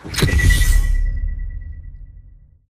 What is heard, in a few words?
Map Hack Radar Pulse; Burn Card; Titanfall 2